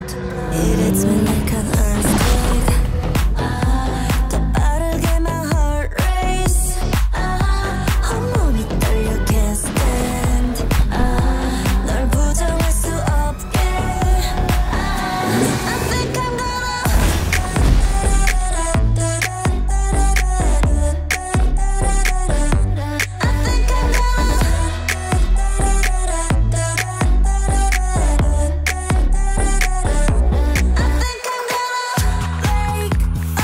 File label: earthquake